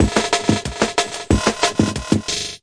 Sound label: 184 bpm, DRUM LOOPS and BREAKS; 181 bpm plus